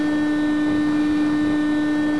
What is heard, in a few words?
Choppa